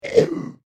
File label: Fantasy Monster Sneeze; Andrew Fleming